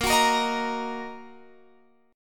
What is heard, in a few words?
Bb Suspended 4th Sharp 5th Bb down Guitar Open F; strummed